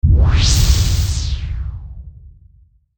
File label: element fire; maoudamashii